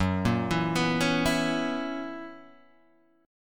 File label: F sharp 11th F sharp Guitar Open G